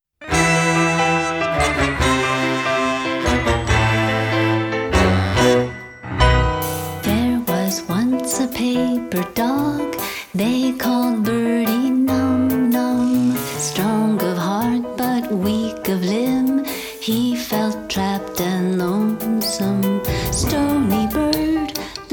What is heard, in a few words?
Jazz for Dogs